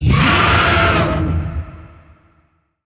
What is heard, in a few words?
Vocal Attack Short; Dialog; Warframe; Zariman; Void Angel